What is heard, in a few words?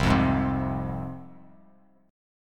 C Suspended 4th Sharp 5th, C, down, Bass Standard